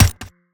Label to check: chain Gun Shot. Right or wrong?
Right